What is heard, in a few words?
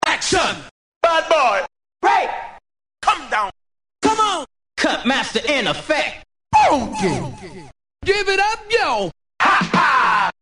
scratches; shanty; electronic